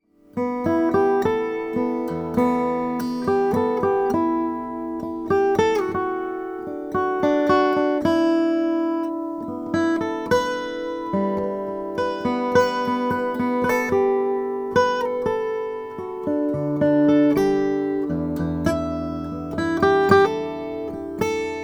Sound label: sexauer f 14 brazilian rosewood